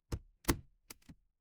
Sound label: office stapler